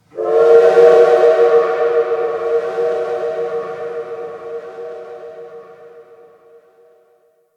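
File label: Shipyards Distant Train Horn; Warframe; Grineer Shipyards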